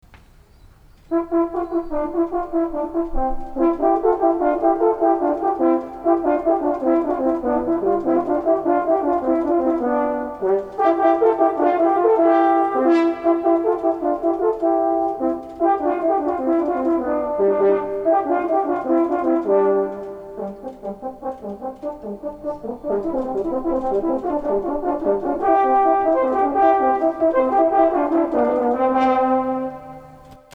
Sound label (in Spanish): DUO FANFARRE